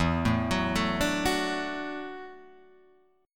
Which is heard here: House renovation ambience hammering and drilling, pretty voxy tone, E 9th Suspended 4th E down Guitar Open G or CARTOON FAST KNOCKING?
E 9th Suspended 4th E down Guitar Open G